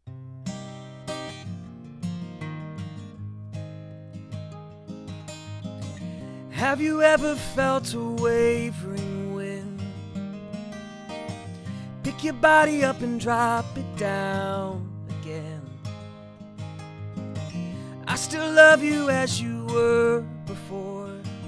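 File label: Music, figure 8; usb mic; Samson GTrack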